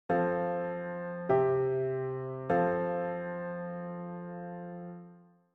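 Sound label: F major PAC; melodic dictation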